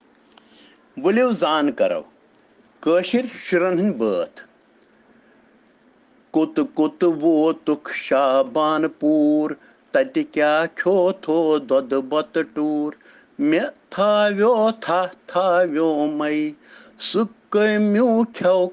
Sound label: Children Rhyms in Kashmiri